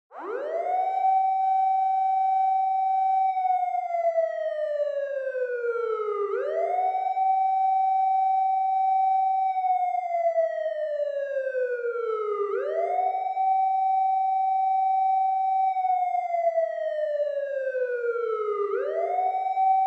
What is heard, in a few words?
Police Car Siren Far